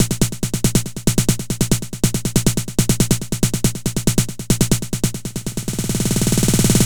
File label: Snare Loop, Drums, Dance Beats